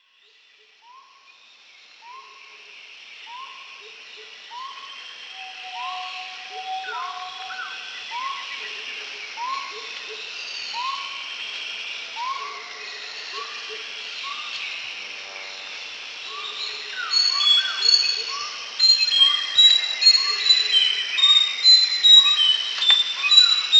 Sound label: slaty-breasted tinamou collared trogon